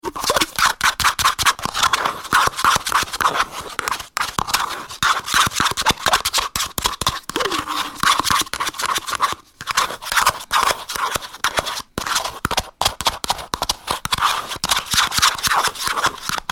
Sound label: Scraping Yoghurt Pot, Scraping the last bits of yogurt out of a plastic pot with a spoon.; Eating; Human